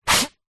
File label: human blow nose into tissue paper short